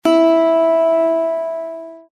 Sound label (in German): Zithermacher Ton 8 LAUTER